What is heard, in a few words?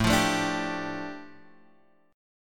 A 7th Suspended 4th Sharp 5th, A, down, Guitar, Half Step Down